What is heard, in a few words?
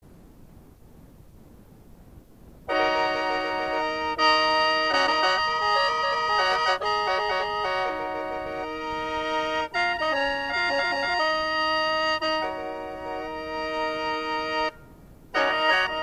Afro-Cuban